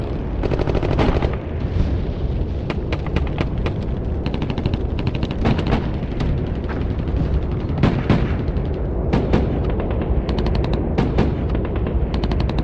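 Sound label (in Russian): zvuki vojni, war sounds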